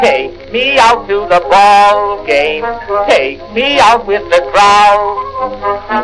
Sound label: with the crowd